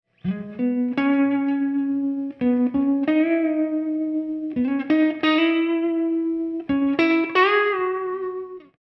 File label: Guitar; Tsar